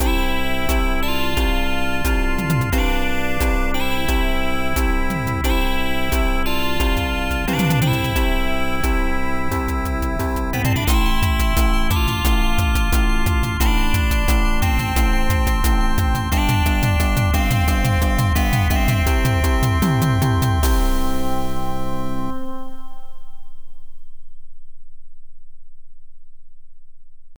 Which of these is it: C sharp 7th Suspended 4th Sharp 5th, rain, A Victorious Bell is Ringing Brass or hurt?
A Victorious Bell is Ringing Brass